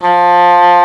ENGLISH HORN; East West; Global Sounds

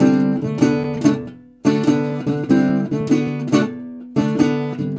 Blues Guitar Samples

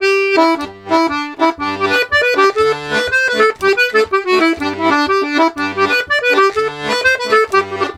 120 GIGUE, Complete Accordions; USB Soundscan vol.40; AKAI

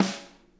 SNARE, Interactive Drum Kits; Sonic Reality

